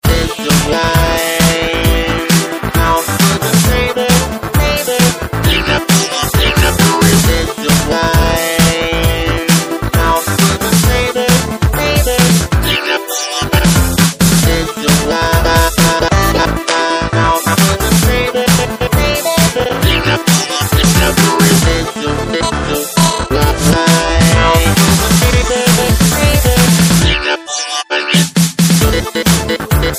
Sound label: Samsung Galaxy A21s